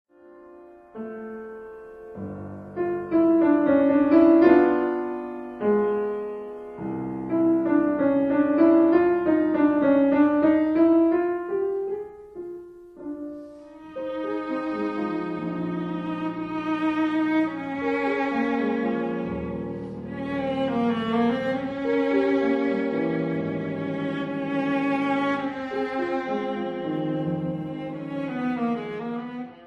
Sonata for Viola and Piano in F minor Op 120 No 1 II Andante un poco Adagio; Brahms